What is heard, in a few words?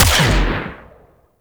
Shape Blast Shotgun 3D Fast Fire, Weapons; Warframe; Corpus